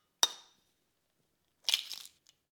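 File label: breaking an egg, chicken egg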